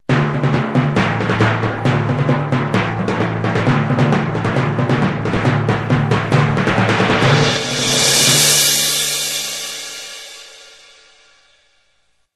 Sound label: Timpani; Sony; Xperia Z3